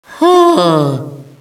sigh, Echo inside caves, underground; DS; ML BOWSERS INSIDE; Luigi

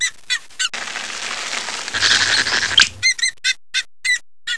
Monkey Ratchet Noise; PC; MARIOTEACHESTYPING2

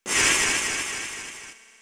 cymbols; SNES; mallow; SMRPG

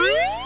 menu popup; GAMECUBE; SUPERMARIOSUNSHINE

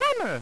hammer; GBA; MLSS; mario

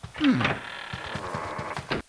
exit a room; GAMECUBE; LUIGISMANSION

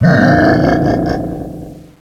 "bwahaha", Echo inside caves, underground; DS; ML BOWSERS INSIDE

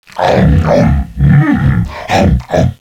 eating; DS; ML BOWSERS INSIDE

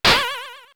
parasol; SNES; peach; SMRPG

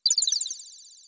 birds; SNES; SMRPG

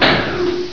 Power Clank; PC; MARIOSFUNDAMENTALS

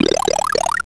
Power up; SNES; SMALLSTARS

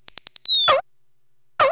Cartoonish Sound; PC; MARIOTEACHESTYPING2

